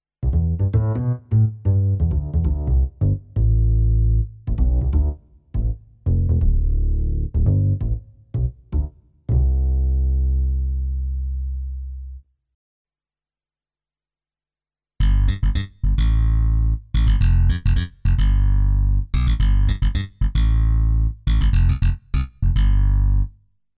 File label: Kross Bass